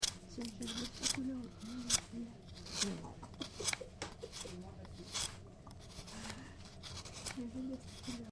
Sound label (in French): sabler